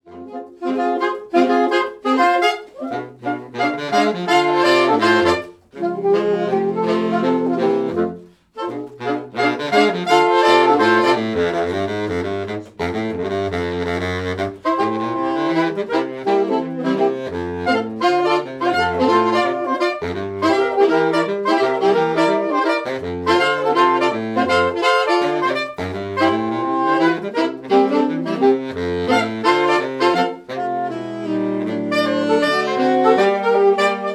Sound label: Maple Leaf Rag, saxofonia